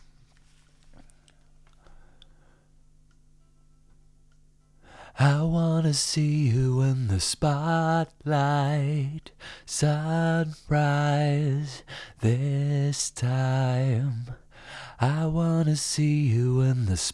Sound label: vocals